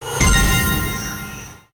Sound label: nice win; Fruit Express; slot game